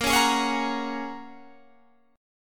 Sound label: Bb Minor 7th Sharp 5th, Guitar, Open G